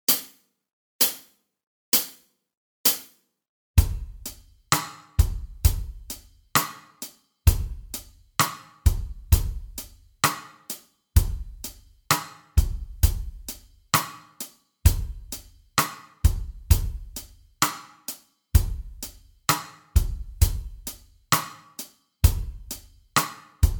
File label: Slide Jam Track backing; Guitar Techniques 2018 Issue 289 December